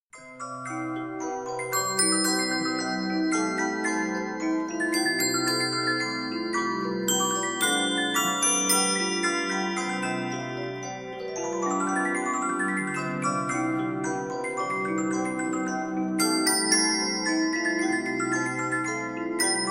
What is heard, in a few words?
music box